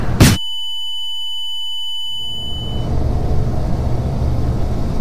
flashbang

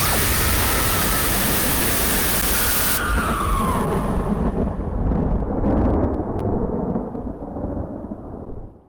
scary sound effect

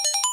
notification; gta v

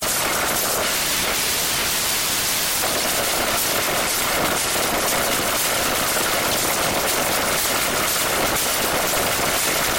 the loudest noise ever

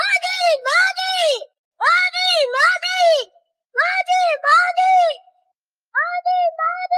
modi modi modi modi kids

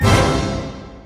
glissando hits

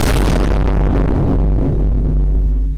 vine boom bass boost sound effect

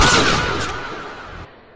gunshot

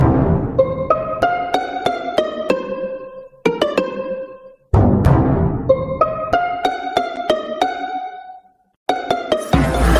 drip theme song; among us

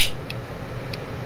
switch click lmao

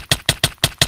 hits de minecraft

